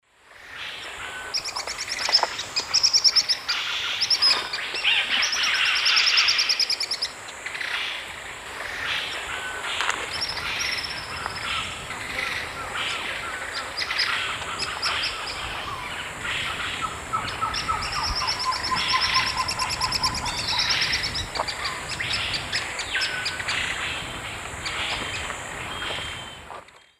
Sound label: Chelidoptera tenebrosa, songs or calls pair; S. America; Eastern Lowlands